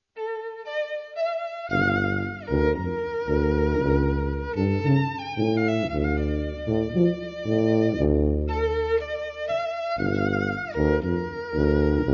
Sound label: tango